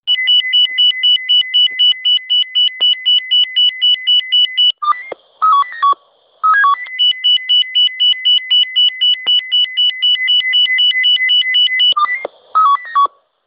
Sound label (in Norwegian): testalarm brann